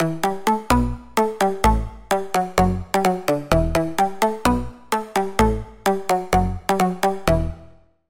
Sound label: Pluck, Sylenth1